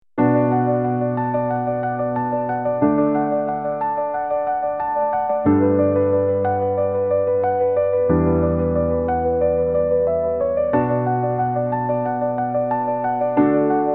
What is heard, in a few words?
uplifting strains